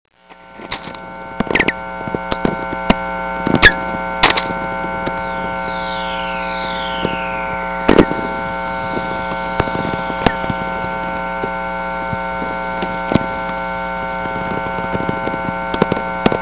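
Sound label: whistler; Natural VLF Radio Emissions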